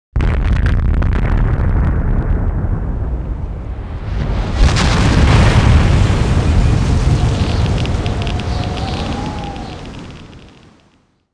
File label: Nuke boom, disasters